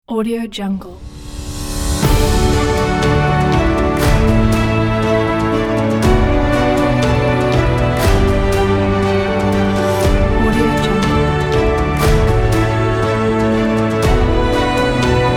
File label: inspirational piano